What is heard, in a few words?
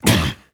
exhale fall